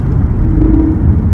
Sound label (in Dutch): Bijgeluid opkomen koppeling terugschakelen